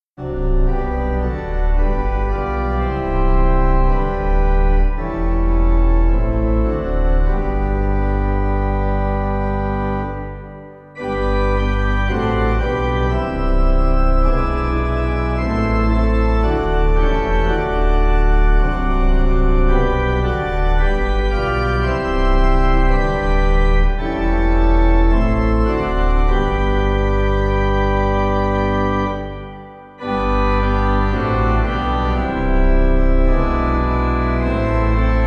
Sommerlied Pipe; God Who Made

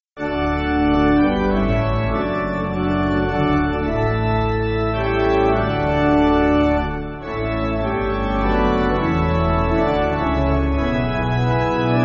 Pipe, church music

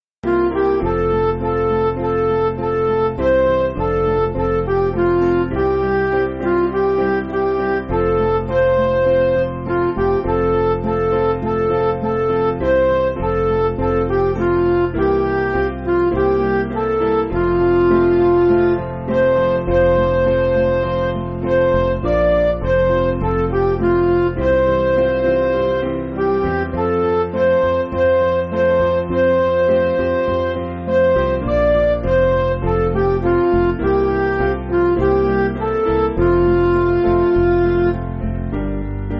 Midi

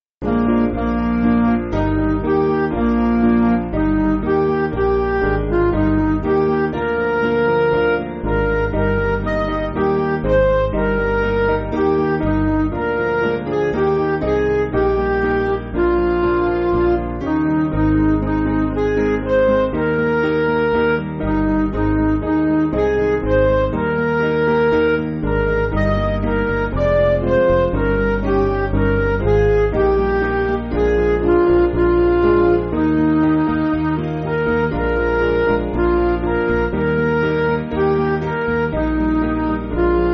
Midi; O People Who Will Go; Hartmann; Promise

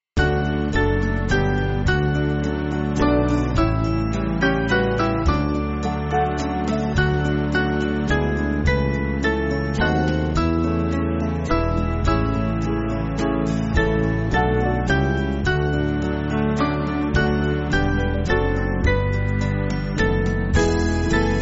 Band; Come Holy Ghost Life; Hornsea

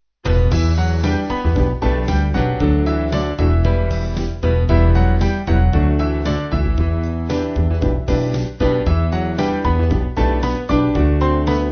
Band

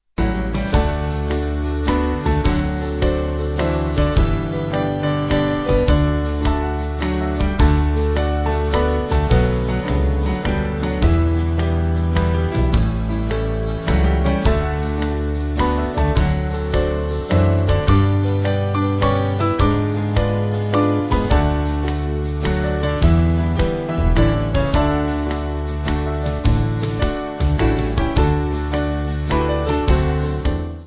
Band

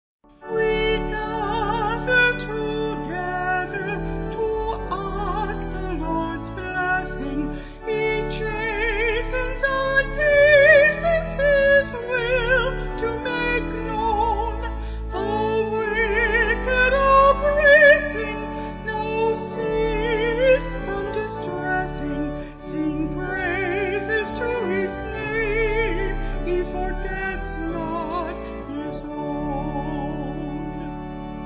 We Gather Together Kremser Vocal Organ; Vocals and Organ; Sung Lyrics; Easy Listening

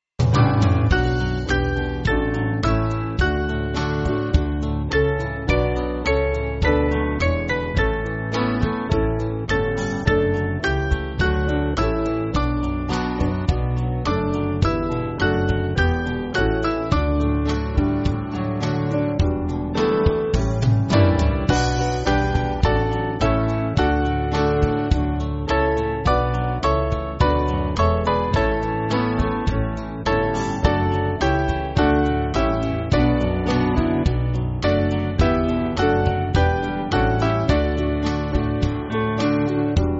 Band, church music

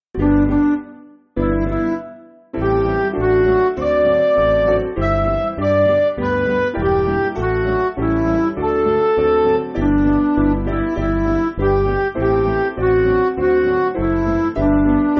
Midi

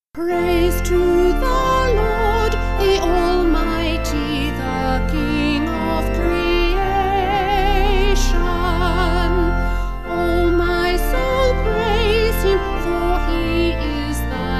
Vocal Organ

